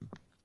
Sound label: footstep; Ludum Dare 46; Implement footstep sounds when walking